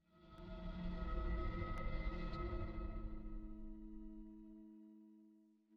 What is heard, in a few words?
hum